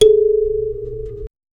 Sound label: strike the kalimba softly